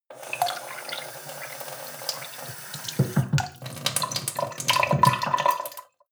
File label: sink water pot soft; industrie-wirtschaft; gastronomie